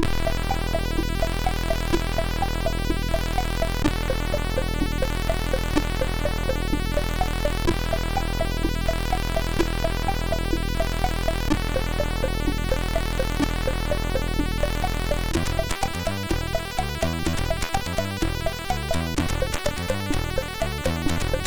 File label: Funk, MOS6581; Even Funk Die; Barracuda; MOS6581R2